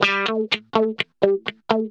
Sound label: 125 a, guitar licks; PS-28 Vocal House Samples; instrumentloops